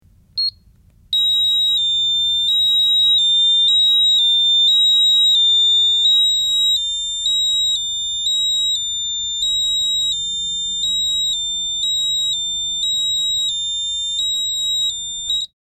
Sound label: Alarm Code Beeps Rep; hi-tech various